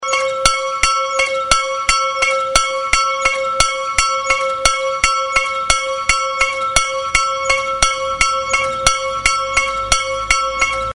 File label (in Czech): zvonek; brehov